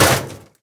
metal vent impact; physics

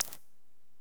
ui scroll click; Half Life Alyx

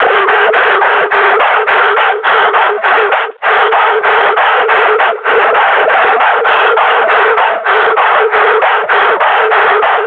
panting, combine grunt

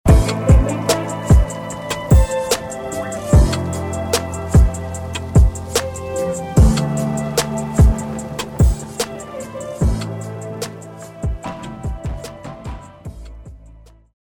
start action, music

